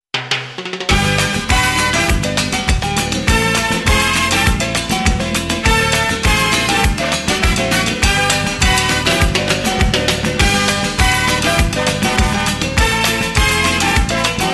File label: samba